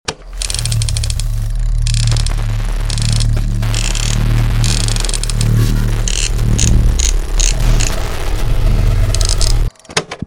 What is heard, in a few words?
Radio Channel switch